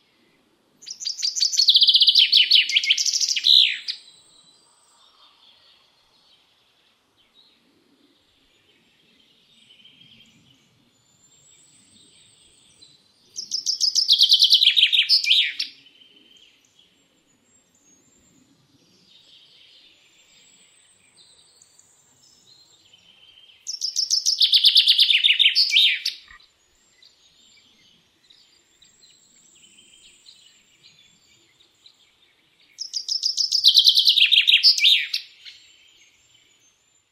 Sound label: chaffinch